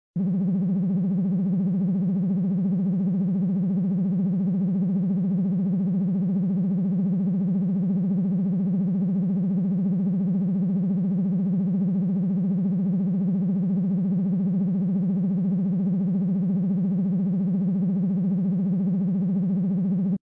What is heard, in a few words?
Reference 200Hz